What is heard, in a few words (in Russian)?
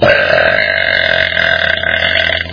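тошнота долгая мужская отрыжка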